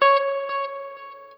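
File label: NOODLE SHORT, Guitar & Bass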